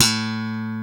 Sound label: POPS, SLAP BASS